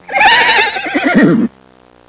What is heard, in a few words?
Neighing horse long